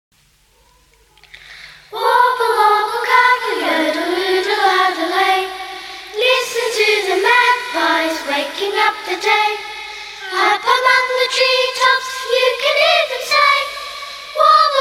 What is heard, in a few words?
Warble Orble